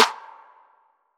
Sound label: snare